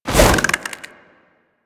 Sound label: Infested Jetpack Jump Woosh; Warframe